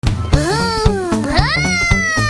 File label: whooooom whooooom